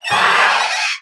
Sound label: Umbral Owl Attack